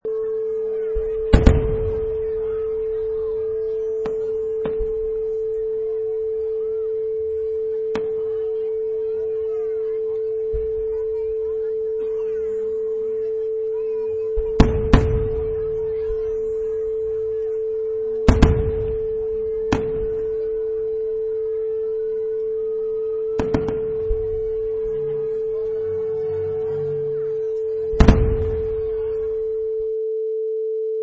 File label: Firework Display